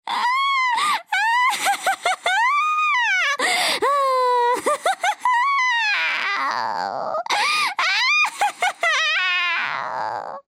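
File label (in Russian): witch rejoices that she deceived; Для Хэллоуина; Коварный злой смех старой ведьмы, забавный смех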